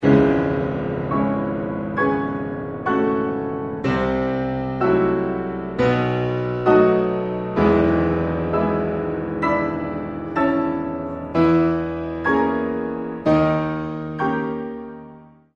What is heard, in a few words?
rachmaninov prelude in c sharp minor